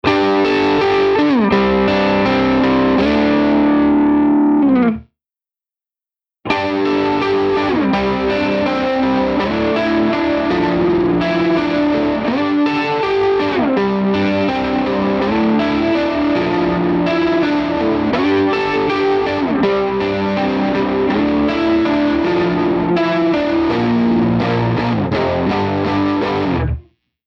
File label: chorus; Mobius